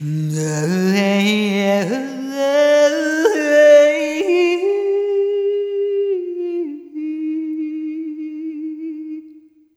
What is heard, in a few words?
Arrhythmic D#; Sonic Foundry (Sony Creative Software); ILONA